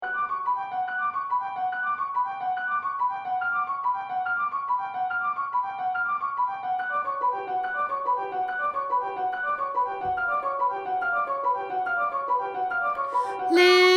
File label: song vocals